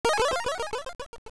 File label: Spell, casting a spell; Link casting a spell; AOL